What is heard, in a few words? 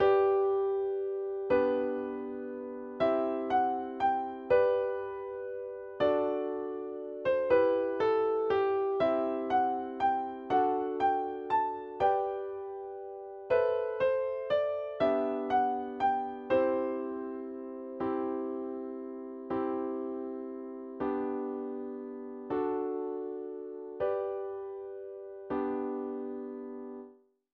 instrumental